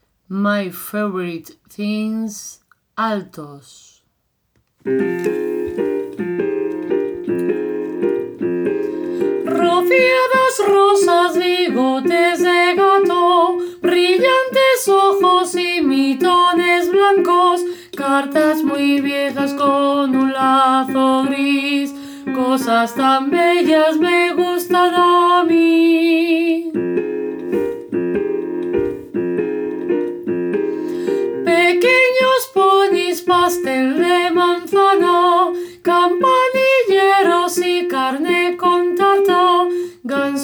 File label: Alto